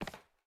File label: Step Heel Wood; MiSide